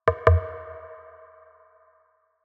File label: open up; message tones